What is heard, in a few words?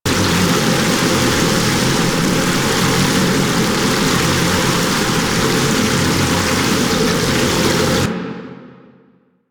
circular waterfall loop; Ambience; Props; Warframe; Duviri